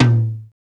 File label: TOM; E-Lab; X Static Goldmine 1